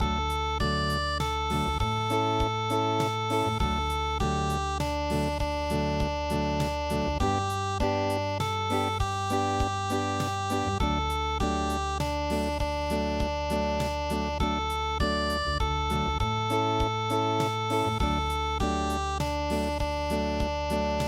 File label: unpluged